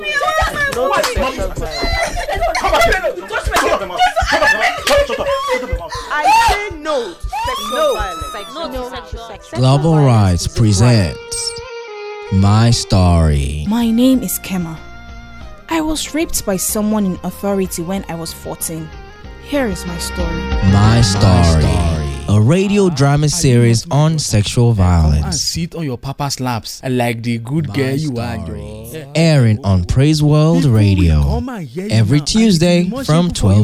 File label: Drama Series Jingle; My Story